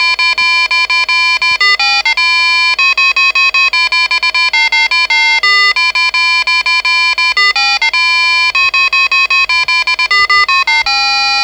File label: jingle bells; maximus